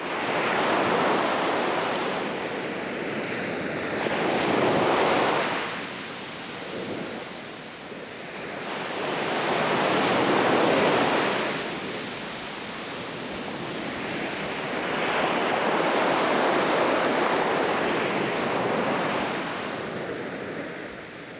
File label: ocean; ambient neptune